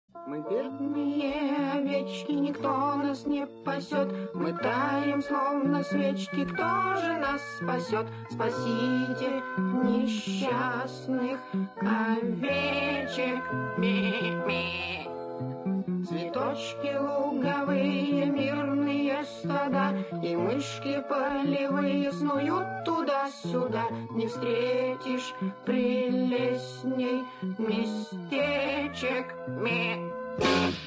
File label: sheeps song